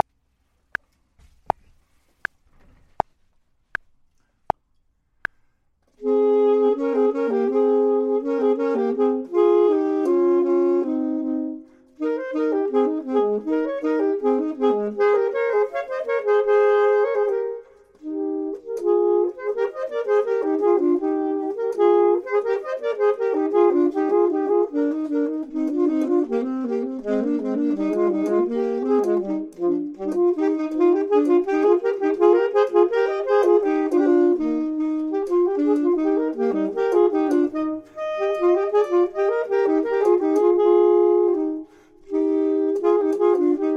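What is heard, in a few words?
both, Saxophone and Clarinet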